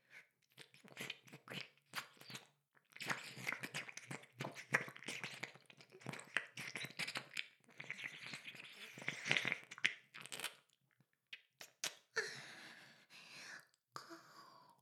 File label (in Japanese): 耳舐め音声, ASMR; ダメなメイド